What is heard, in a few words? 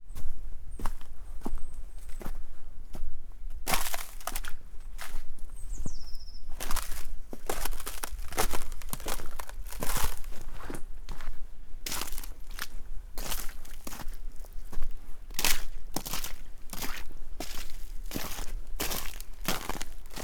Walking Thin Ice